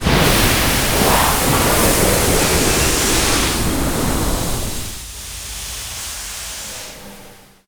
fire suppress sys; Quake 4 Alpha

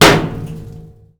metal barrel med; Doom

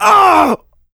pain large, player; DoomRTX; big

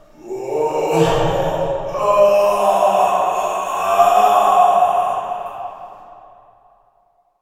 prison pain; Quake 4 Alpha; id software

